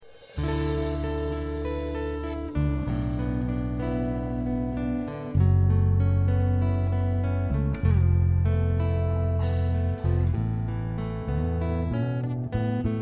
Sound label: Asian Jazz Performance Intro; iSHONANチャリティーコンサート; charity concert 2009; アジアン味のジャズ演奏